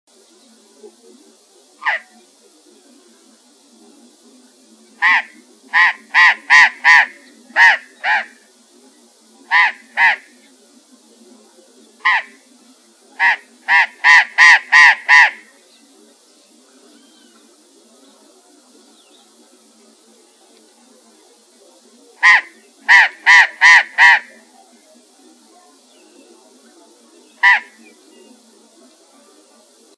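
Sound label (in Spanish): lorobarran, Loro Barranquero, Cyanoliseus patagonus, Burrowing Parrot; cantos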